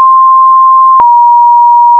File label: doppler